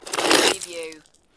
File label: Snow and Ice, Ice or snow scrape with debris